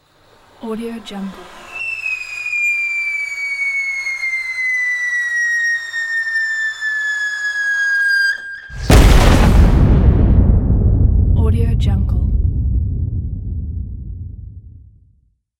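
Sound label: cartoon big bomb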